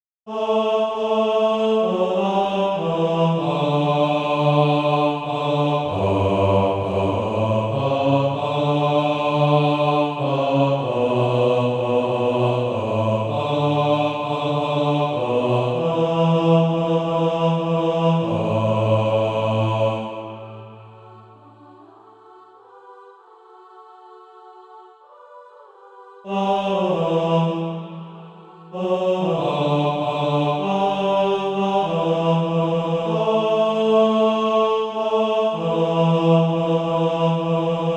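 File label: bas, Practise Music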